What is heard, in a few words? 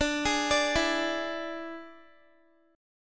D Suspended 2nd Flat 5th Guitar; arpeggiated